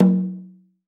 AFRO.TAMB, AFRO.TAMBORA; AKAI S-Series CD-ROM Sound Library VOL-5

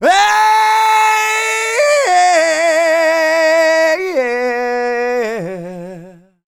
R ROCK, MALE HUMAN; ILIO; Vocal Planet

